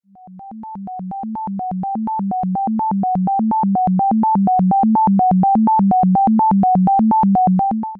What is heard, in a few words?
cambiata illusion fade Right; This is a stereo sound file